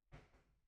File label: VOC